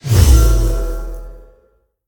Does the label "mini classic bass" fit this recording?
No